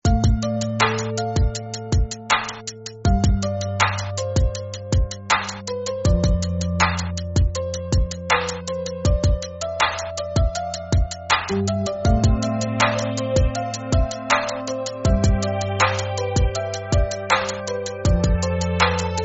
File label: Slow RB